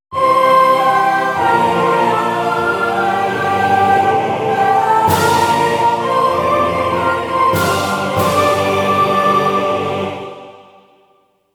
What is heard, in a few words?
ending fanfare; metroid